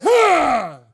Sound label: voice attack male; Blind Gladiator